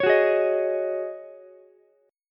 Db Suspended 2nd Suspended 4th, Ukulele, Dbsus2sus4 chord; strummed; JGuitar